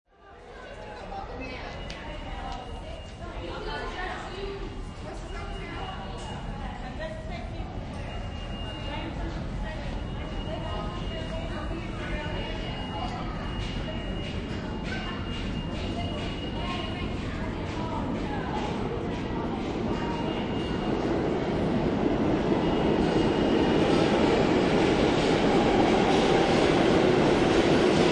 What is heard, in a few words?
Subway Station, Subway station ambience, NYC, Canal Street Station. Underground train station; few people talking, subway train arriving and departing; Lynne Music